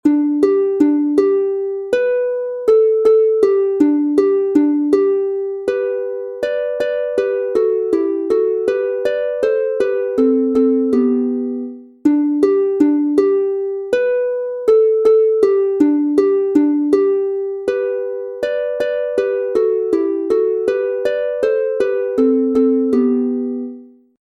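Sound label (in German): G Dur 2 stimmig instrumental, Harfe; Jetzt faengt das schoene Fruehjahr an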